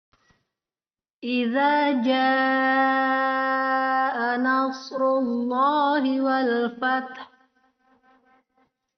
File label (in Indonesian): Perekaman baru Karaoke denoised